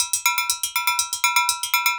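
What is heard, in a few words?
120 bpm, Congo; Spectrasonics; Supreme Beats; World Dance; Isle Congo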